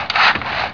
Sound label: build; zrush